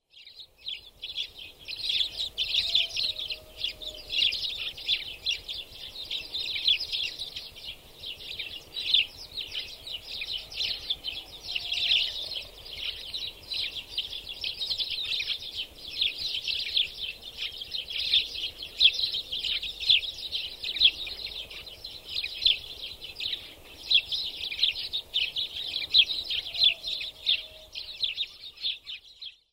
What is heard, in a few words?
ANIMAL SOUND SPANISH SPARROW